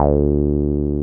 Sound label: 303 OW D3M, 303 OW BS; Drums In Your FACE!